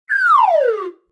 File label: fall